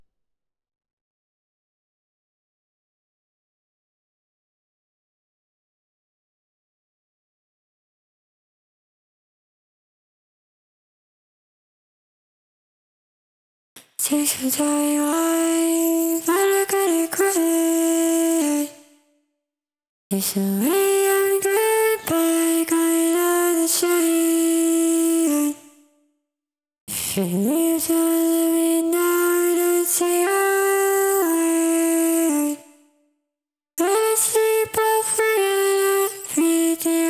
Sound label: vox clean